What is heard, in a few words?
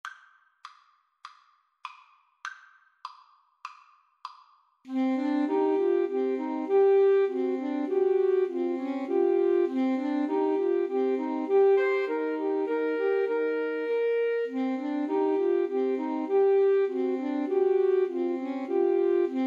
grieg mountain king, Alto Sax Trio